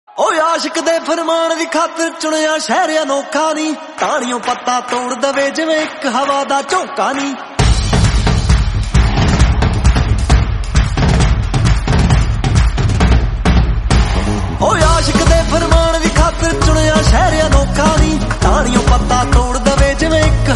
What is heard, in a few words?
punjabi song ringtone